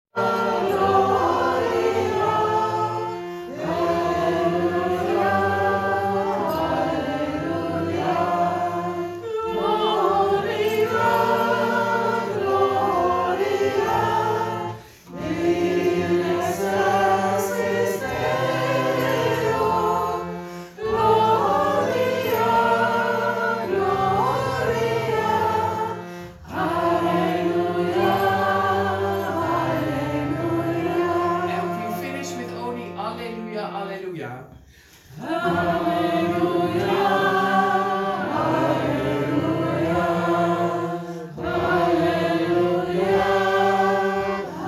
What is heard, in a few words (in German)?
halleluja